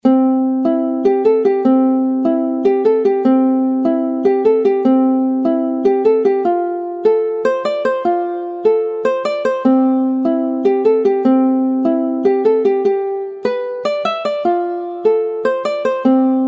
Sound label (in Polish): rocknroll cwiczenie ukulele